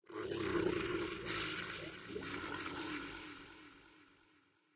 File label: entrance, undeadtower